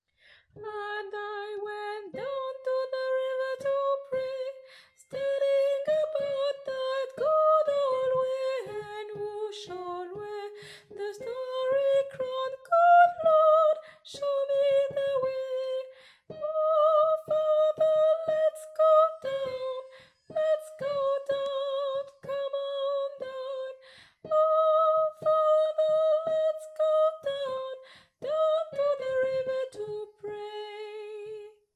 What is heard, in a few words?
tenor